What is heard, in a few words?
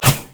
human basic attack; Elise